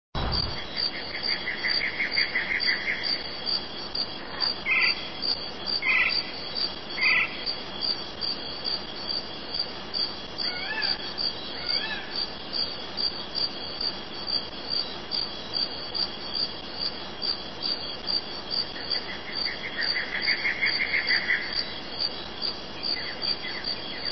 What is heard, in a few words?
jungle